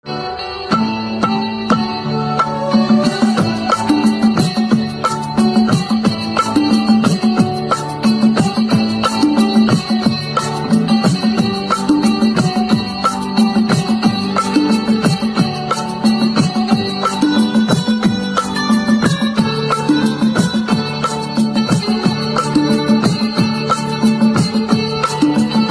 Sitar Instrumental Ringtone

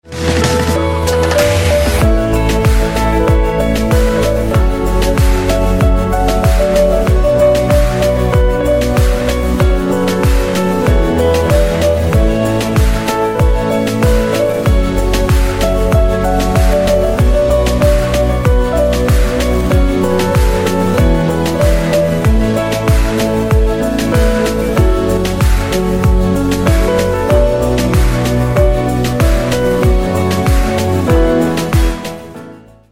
Piano Instrumental Ringtone

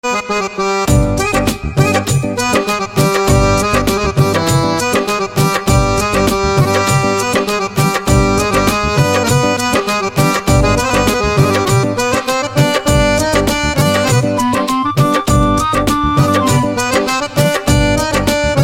Harmonium Instrumental Ringtone

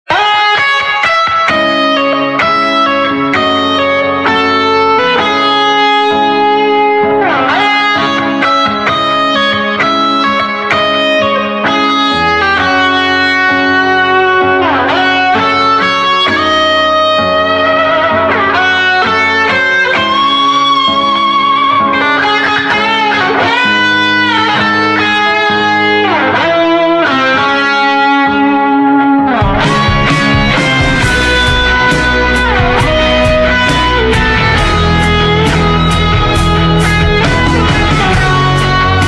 Eletrical Guitar Instrumental Ringtone; english instrumental ringtone; Godfather

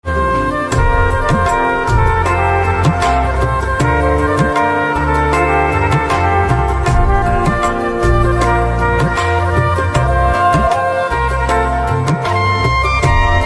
Organ Instrumental Ringtone

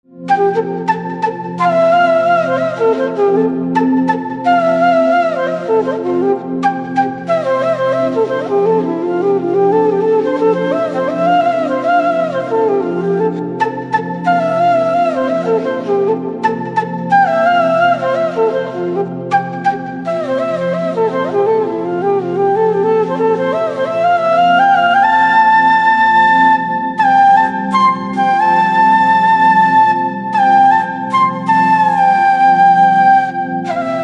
BGM Flute; Movie; krrish

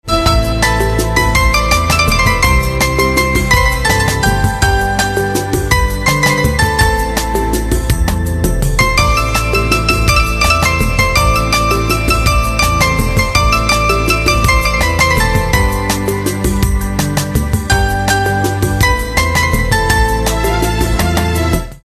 Piano Instrumental Ringtone

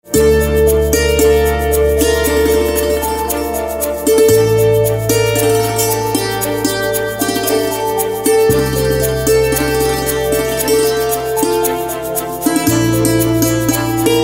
Santoor Instrumental Ringtone

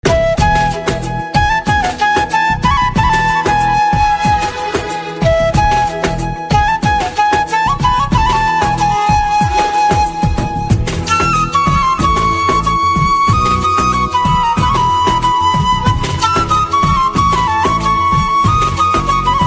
Instrumental Ringtone

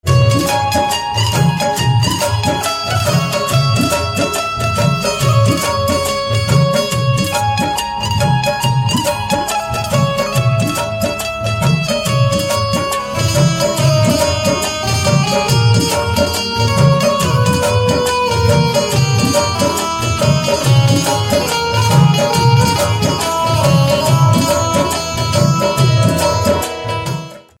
Banjo Instrumental Ringtone; Hero Movie

